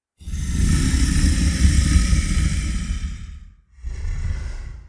monster scary growl oh no scary

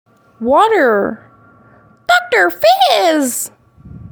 hehehehhehehhehehhehehheheheh

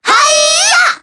hi yah

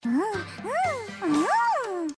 happy giggle; nezuko

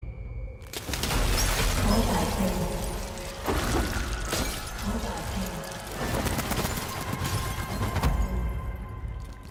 call back pings

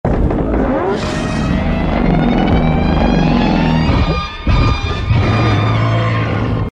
roar; ghidorah

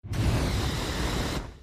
continuous fire short

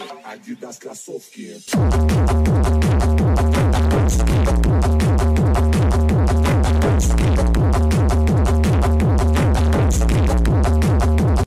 bass

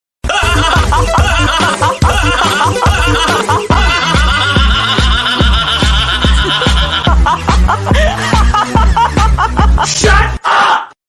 hahahahahahahahahah; meme

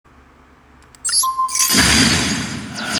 card destruction

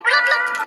bubbles blip blip

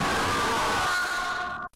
jumpscare; fnaf a childs past

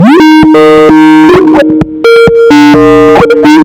bass boosted; skype

